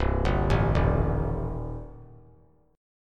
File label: C Minor 6th, chord; Cm6 arpeggiated; JGuitar